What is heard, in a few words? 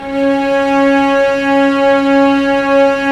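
cellos, piano, ambience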